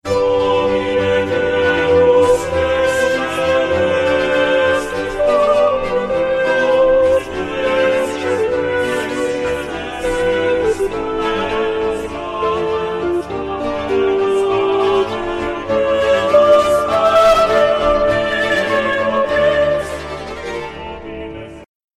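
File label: Gloria (sop), VirtualVoice; Stage Zelenka 2023; zelenka zwv21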